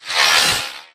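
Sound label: Drone Movement Turn; Titanfall 2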